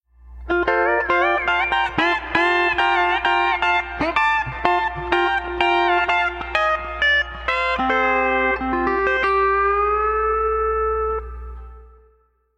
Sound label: Bouncy, pedal steel